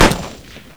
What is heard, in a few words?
30cal; WAR